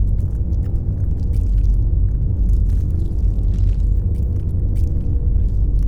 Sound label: pro ship colossus idle; biogenesis